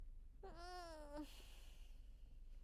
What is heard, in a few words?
hope noises